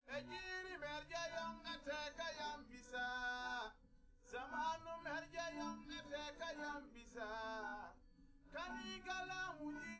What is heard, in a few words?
nodrums